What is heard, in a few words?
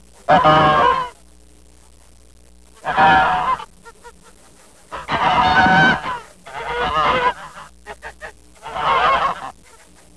goose honk; Sound Effects for Multimedia - Volume 1